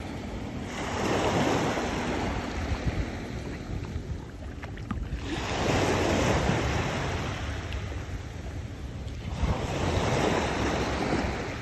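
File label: noise of waves while rowing at sea; v klivli posle karantina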